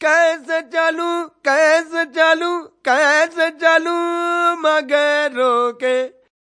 male devotional, Indian Singing; VOCALS and SPOKEN WORD

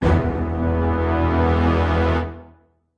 orchestra hit melody kit

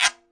arabic perc, Arabic Percussion Kit; Arabic Percussion Kit 3

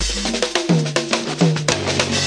106 bpm wicked tom break

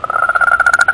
toad; Zoo and Nature

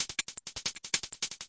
160 too crispy hats, drum loops and breaks; 141 to 160 bpm

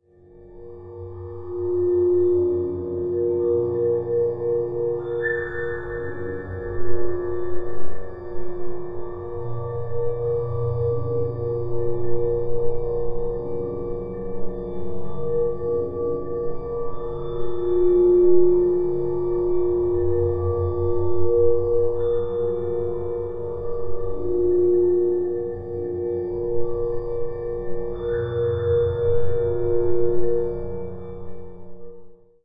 breathy harmonic drone; Pads

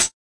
hat; Hats

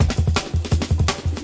167 faster frenzy, drum loop; 161 to 180 bpm

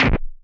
nasty stormfront; Nasty Stormfront Electro FX

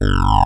throat res, synth

